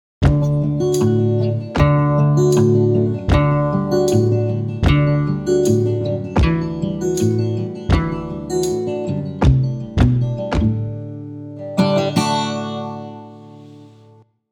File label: Jingle; Texas Coffee Traders; Advertising